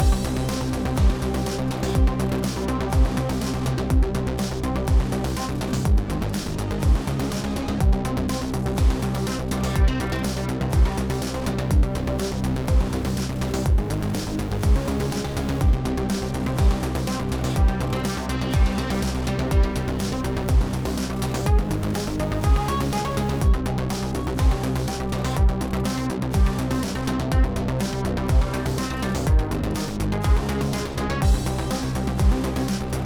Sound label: Combat 8CH 123BPM